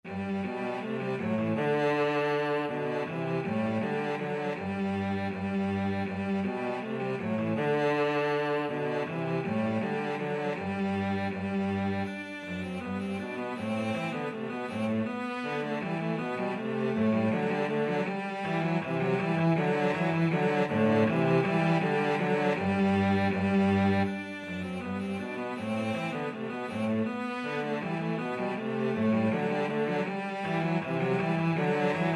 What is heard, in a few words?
ding dong cello duet